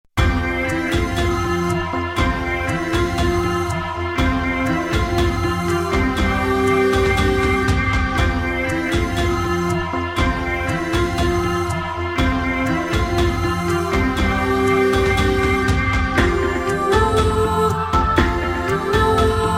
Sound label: Old Assamese song